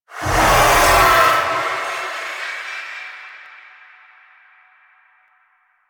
Revenant Shrine Specter Killed; Warframe

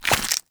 Agaric Mushroom Grow; Objects; Pickups; Resource Materials

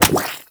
Chem Strike Nox Rifle Projectile Impact

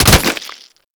Infested Devourer Swing Hit A; Warframe

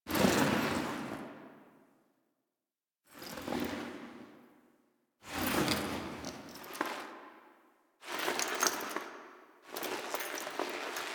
Intro Foley; Cinematics; Warframe; The New War; Zariman

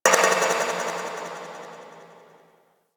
Distant Metal Ronks; Ambience; Warframe; Corpus Railjack

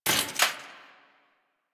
Arch Rocket Crossbow 2D Mech, Weapons; Warframe; Archwing

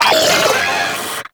Arachnoid Vocal Leap; Enemies; Vocals; Warframe; Corpus; Arachnoid Hunger

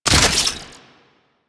Hunter Crafting Equip, UI; Warframe; Cetus

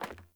Sandals Run Metal, Footsteps; Characters; Player

